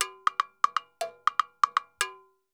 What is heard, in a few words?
Timbaleta Bomba 120, Drums & Percussion, 120 bpm; Best Service; Festa Latina VOL-1